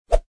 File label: swish